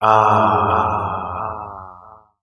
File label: "ah" relax; ooh ahh